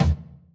tom sample d sharp key, Royality free tom single hit tuned to the D# note. Loudest frequency: 292Hz; tom drum